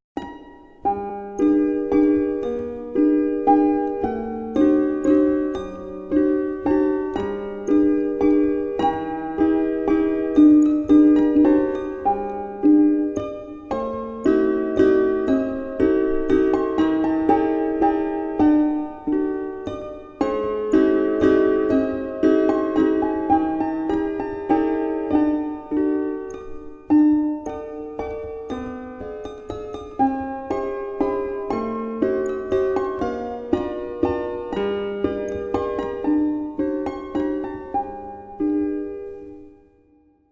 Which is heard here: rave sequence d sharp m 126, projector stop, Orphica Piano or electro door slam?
Orphica Piano